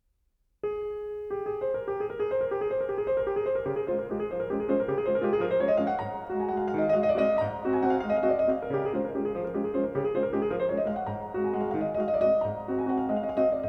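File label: Waltz in D flat, piano; Nikita Magaloff; Op. 64 No. 1; CHOPIN; 클래식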